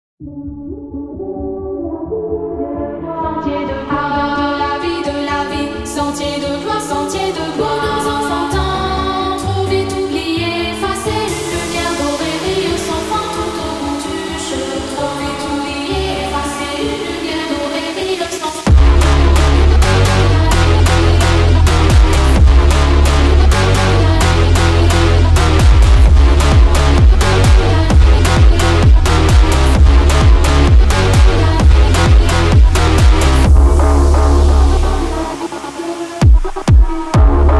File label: bass boosted